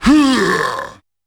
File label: C4 Huuaah!, Yamaha PSR-E323 Sound Effect Kit